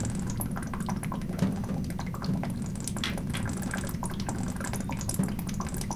WATER FLOW, KITCHEN; 1000fx

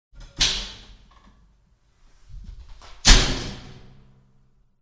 Shower door; SOUND EFFECTS1

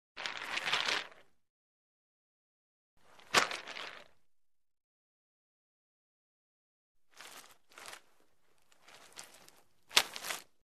Ice Movement In Bag; Hollywood Edge Foley Sound Library

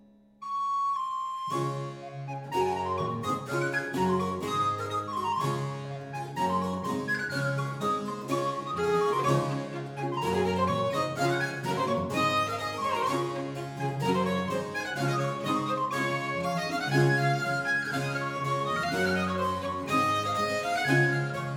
English Country Dance - Traditional

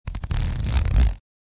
Zipper

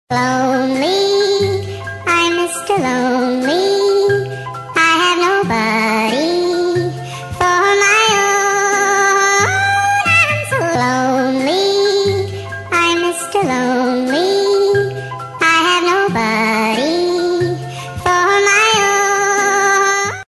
Funny Ringtone

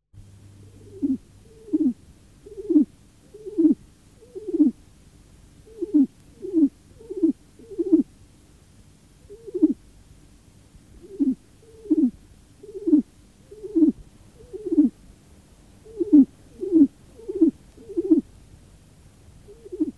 Pigeons; SOUND EFFECTS1

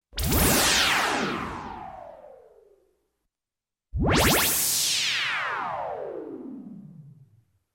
POWERSHOT

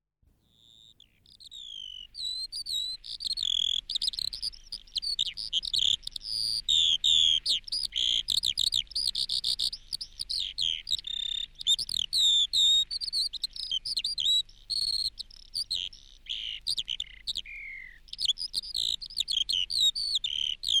Skylark; SOUND EFFECTS1

